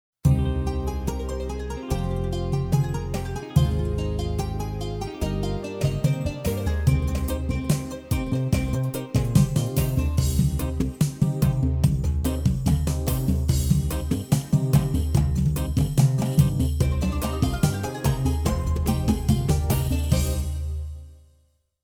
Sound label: Style Khaligi Romba Live, yamaha psr a350 oriental portable keyboard